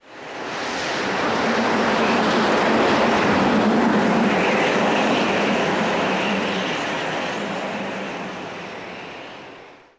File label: wind; DAoC